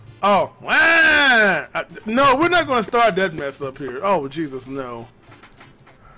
waaaa

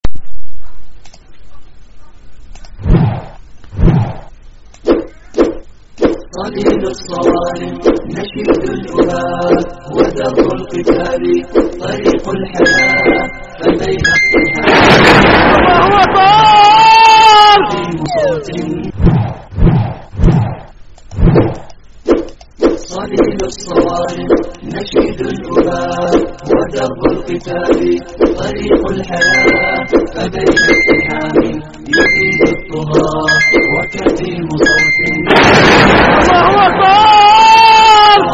arab music

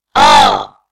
scream

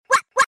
waaaa

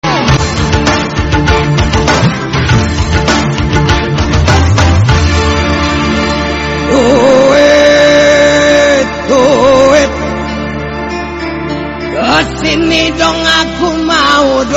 duet

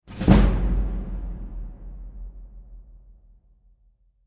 skill level up; skyrim

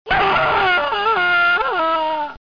fatality scream

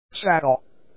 haha

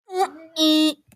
ooo iii

